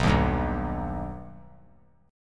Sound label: B Augmented B, chord; strummed; JGuitar